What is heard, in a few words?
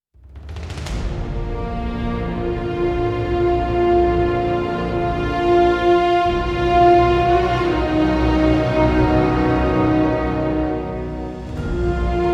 Spawn In